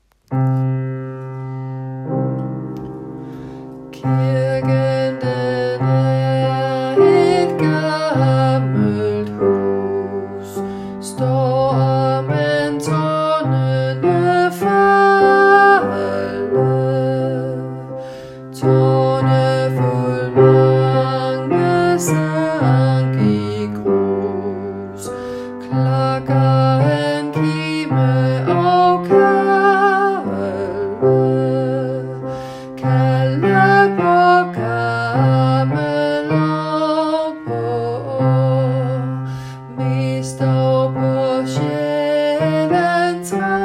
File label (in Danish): bas